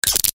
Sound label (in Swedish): klipp Kabel